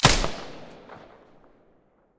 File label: silencer p90 stereo, weapons